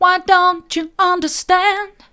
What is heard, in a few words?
why don't you understand mid, vocal